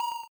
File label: Arrow; castle of no escape 2